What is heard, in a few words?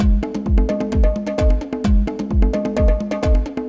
Latin Conga Loop